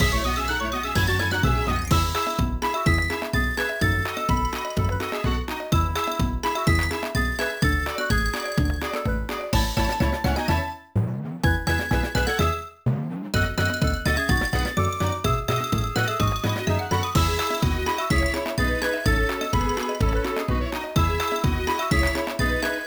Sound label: Battle Tower Reception Desk